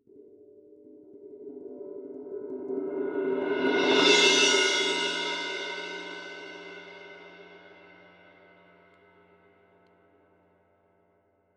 Cymbal Roll

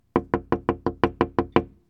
Glass Knocking

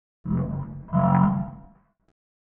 Animal Horror

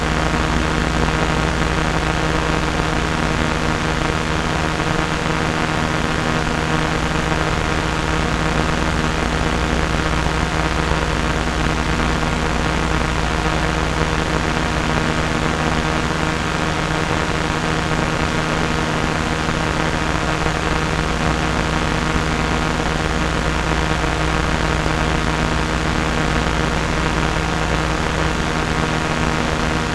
Am Radio Noise

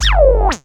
Squeak toy

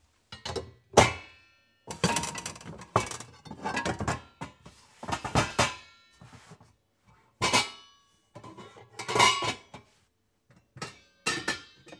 Metal Pots

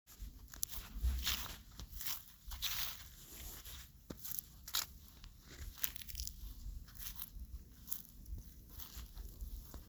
Walking In Grass

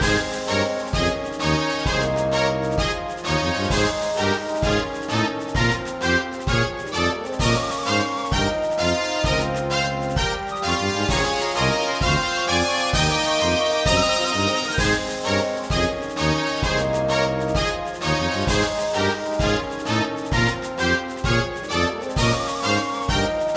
Army Game Theme Loop

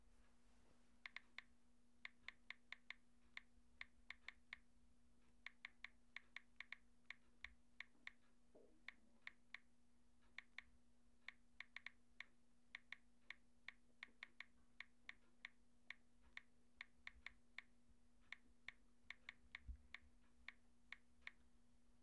Cell Phone Typing

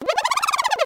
Dolphin Robotic

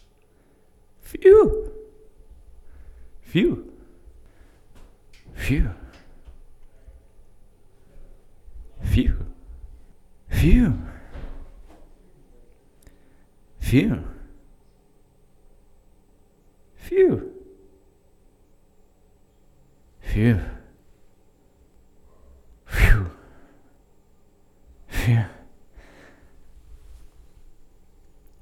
Phew x8

